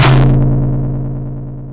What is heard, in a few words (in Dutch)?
Metalen schop, FM; Polyend